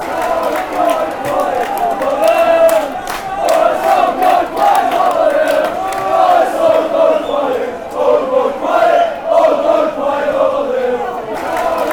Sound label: all gone quiet over there, chants; mwell